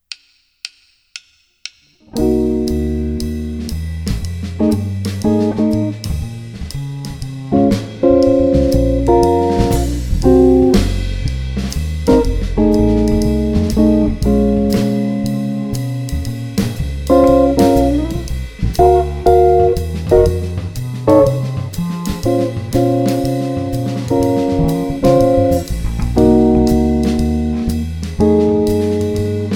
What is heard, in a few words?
II V I Minor; Jazz Harmonia